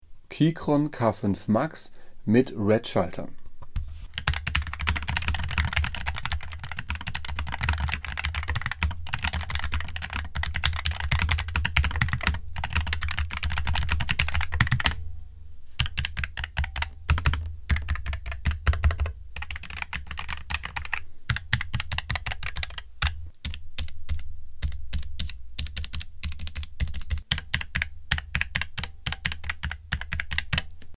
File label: Soundcheck Keychron K5 V1